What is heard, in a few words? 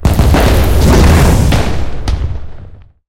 ammo explode, tanks; War Thunder